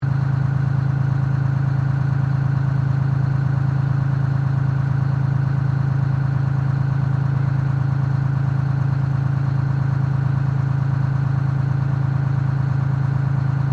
Truck Kenworth 12V71 Idle Reart, Old Kenworth truck